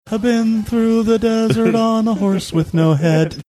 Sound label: singing